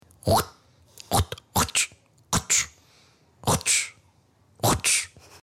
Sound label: bash